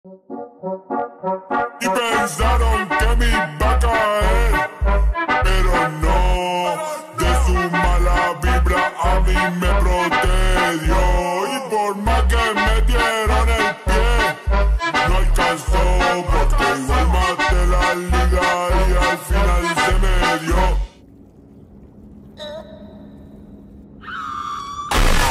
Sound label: fnf